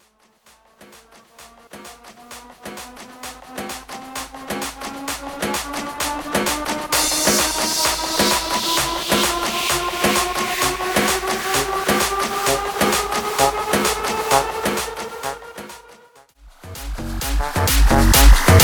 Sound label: Guaracha Remix 130 BPM